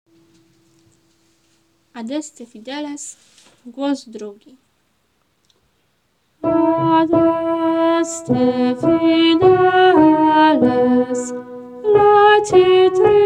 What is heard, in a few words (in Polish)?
Adeste fideles 2 glos; Boze Narodzenie